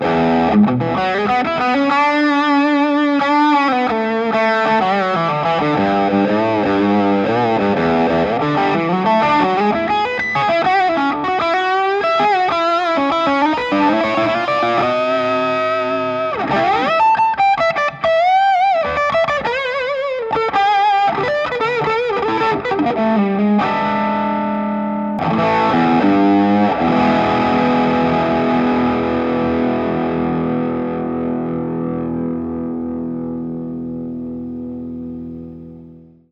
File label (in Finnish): sd1 talla, strat plus